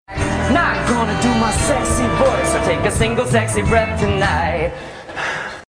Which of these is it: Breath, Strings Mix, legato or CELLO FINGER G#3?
Breath